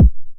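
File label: 909 BD tape, kick drum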